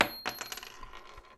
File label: bullet shell bounce wood; sonniss gdc